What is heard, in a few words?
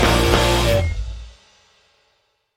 End rock